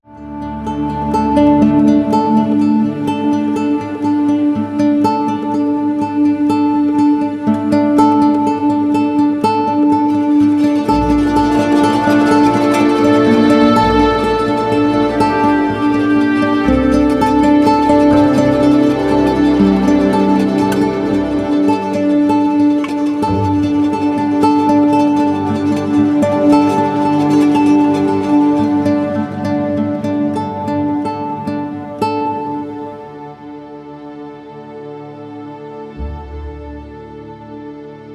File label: makay arp uke